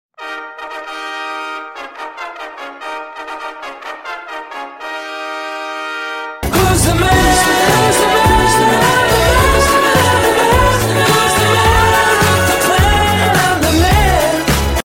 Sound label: fanfare